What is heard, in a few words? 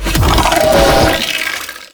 Dive Bomb Attack; Enemies; Abilities; Warframe; Sentient; Brachiolyst